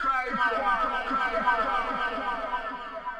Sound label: Vox, 075 Dmin